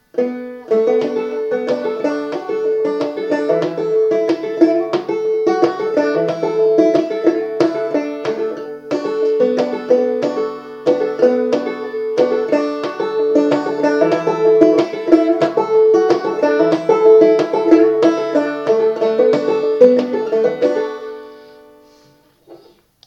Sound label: banjo, Banjo: Open G, Capo 2; Guitare: Capo 2